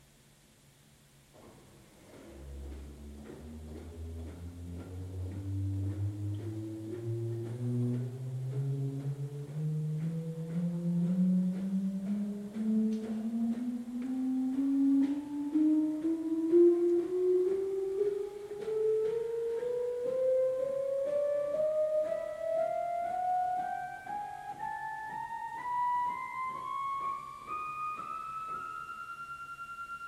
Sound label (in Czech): Manuál Flétna harmonická 8', Ukázka zvuku, varhan